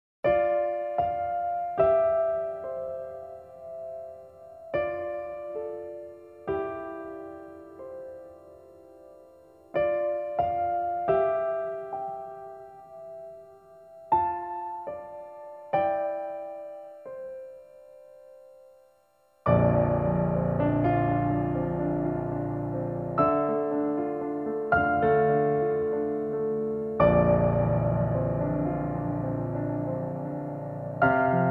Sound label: main theme piano version